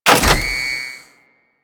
Key Insert; Ambience; Warframe; The War Within

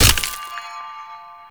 Destructible Plant B Burst B; Warframe; Ambience; Grineer

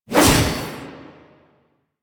Whoosh E; Tatsu Prime; Revenant Nikana

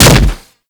Impact Flesh, Weapons; Warframe; Furax

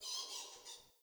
Under City Alley Critter

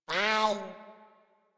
Pet Meow; Enemies; Warframe; Catbrow; Pet Ship

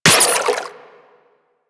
Water Sprayer Beam Impact Flesh; Warframe

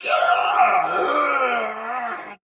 Death Soldier Sword Agent Male, Battle; Dialog; Barks; Melee; Sinecure

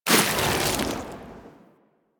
Inf Boomerang Melee Throw A; Warframe; Weapons; Infested

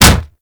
Kick Hit Default; Melee

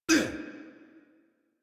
Pain, Adult; Warframe; New War; Adult Operator

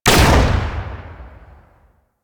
Modular Secondary Barrel Fire, Weapons; Warframe; SU Modular Guns

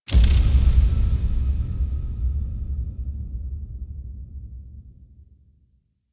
Choice Select, UI; DE; Warframe; Winematic

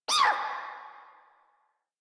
Pain; Grineer Jester

